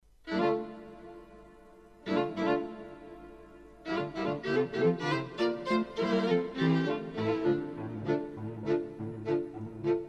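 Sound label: string quartet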